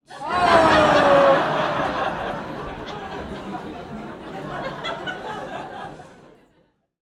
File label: human audience laughter and sympathetic cheer comedy club x200 people; komedia brighton comic boom